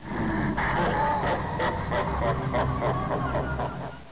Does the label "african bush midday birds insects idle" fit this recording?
No